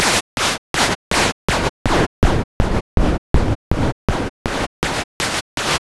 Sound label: pulsar seq; Big Beat Samples & More; AKAI; ESO SEQS KIT